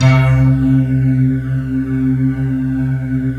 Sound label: C3, SYNTH; RAPHAEL; Q Up Arts; Dream Experience